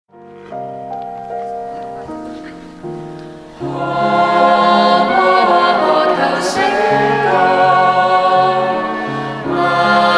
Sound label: Anthem vocal